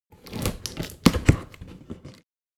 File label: Objects office cardboard box open